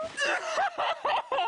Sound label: Funny Msg Tone